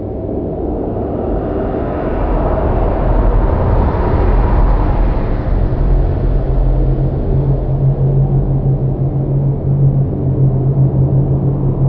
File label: cave, canal; hl2